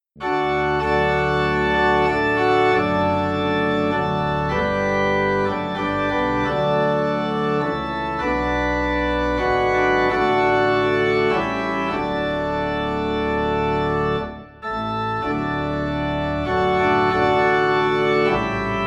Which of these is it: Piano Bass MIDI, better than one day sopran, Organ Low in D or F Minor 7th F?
Organ Low in D